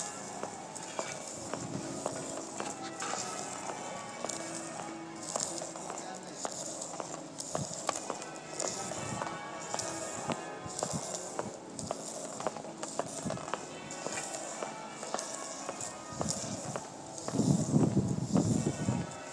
walk along high street while cathedral bells ring half muffled